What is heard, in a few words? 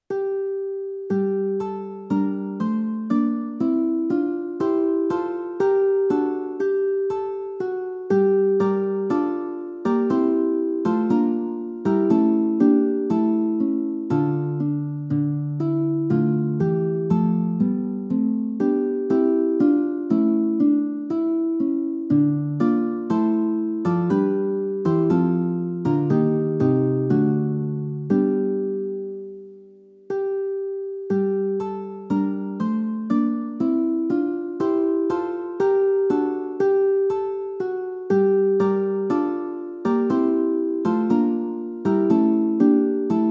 tutti; Hassler; Nun fanget an